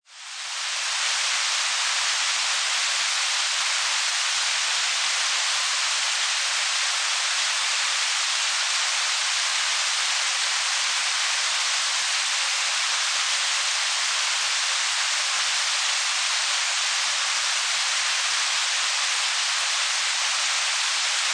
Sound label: Weather Rain Fall On Water, A medium-heavy rainfall.; Rain falls water; Lynne Music